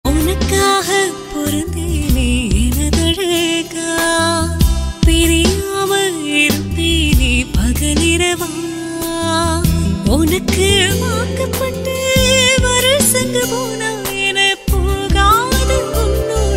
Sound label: female version cover song; soul full bgm